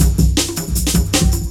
Break, Jungle Breaks; Detroit

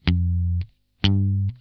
NOTE SCALE, Funk Guitar; 90 s Sample CDs; Zero G

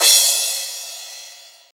Crash, Drums Cymbal; Best Service; House Definition VOL-1; Cymbal Stuff

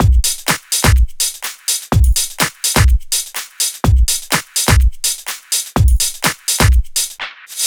Drum Loops, 125 BPM; Sonic Foundry (Sony Creative Software); Underground UK House & Electro

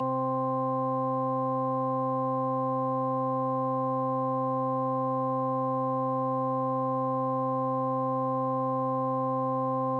ORGAN, Yamaha DTXpress

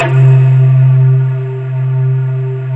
MALLET C3; MALLETTE

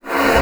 AIR, BASSOON; Xsample VOL-6

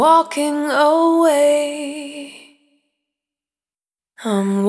Jericho, Single Voice Melodic Loops; Sony Creative Software; Pocket Diva World Class Vocal Samples

